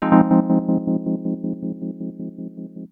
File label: wurl del, dx7